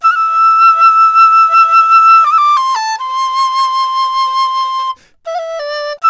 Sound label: DIZU, 90; INIS - Opium